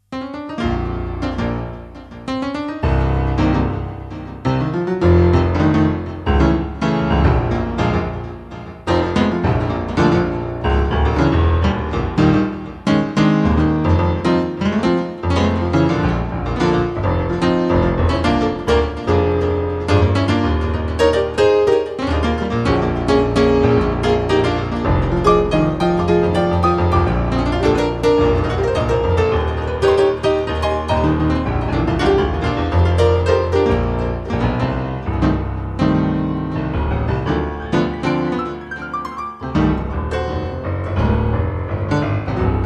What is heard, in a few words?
Blues Piano